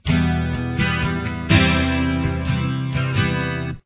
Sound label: chords intro